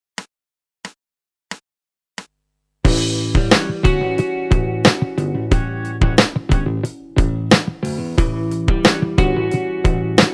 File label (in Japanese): リディアン･セブンス･スケールを使ったおしゃれブルース･フレーズ